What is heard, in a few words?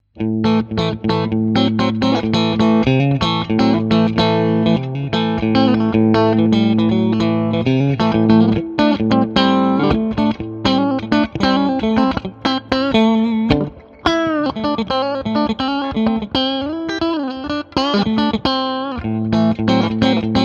deluxe strat